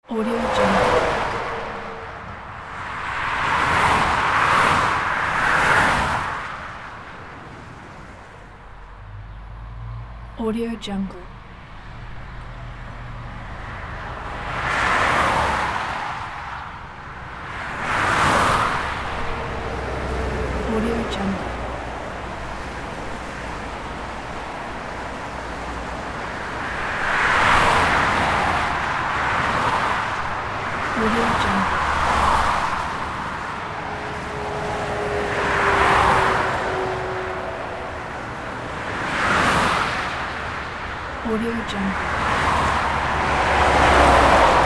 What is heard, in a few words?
motorway ambience; creativetunes